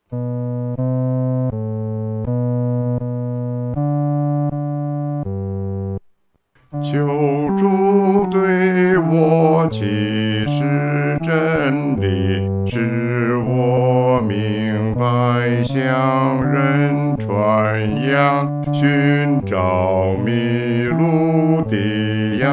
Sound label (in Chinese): 独唱 第四声; 求主对我说